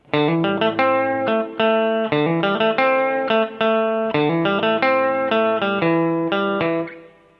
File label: Clean Bridge, nocaster